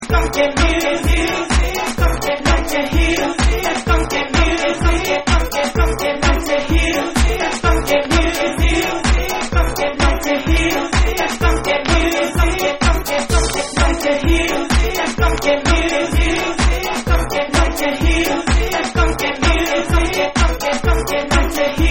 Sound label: FUNKY MUSIC